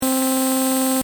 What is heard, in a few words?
Robot move loop, Robot: Robotic Movement Style Effect; Robots; Stuart Duffield